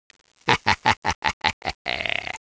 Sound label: laugh